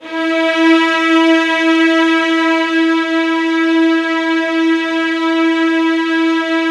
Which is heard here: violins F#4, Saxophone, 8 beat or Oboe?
violins F#4